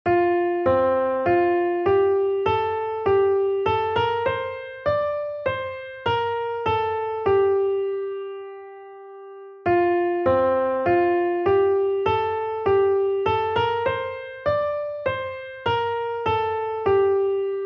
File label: melody; lord our lord in all the earth